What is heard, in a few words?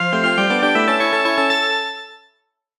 victory